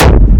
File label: Techno Kick; 30 Techno Kicks 2025; Ghosthack